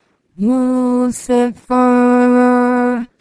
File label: singing